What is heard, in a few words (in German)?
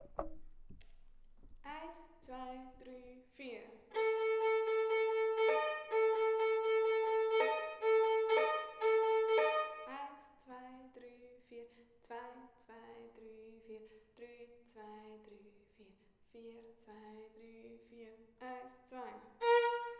Violine; Kinderorchester; Hühner und Hähne; Violine 1; Kinderorchester 2